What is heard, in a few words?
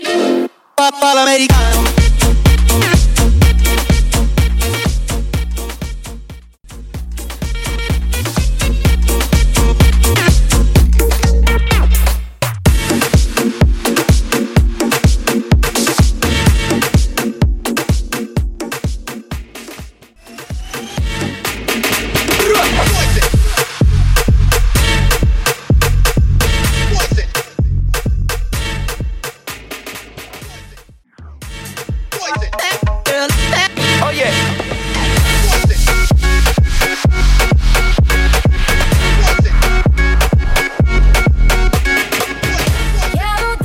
Afro Beat Megamix 125 130 Bootleg